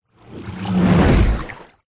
fantasy magic spell dark wet watery whoosh

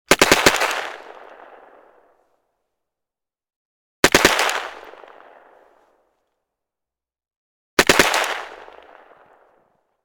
gun carl gustav m45 swedish k; still north media

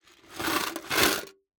Coins Movement Tin

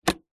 foley wood box case small latch open; zapsplat

